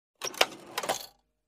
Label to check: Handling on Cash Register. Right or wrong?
Right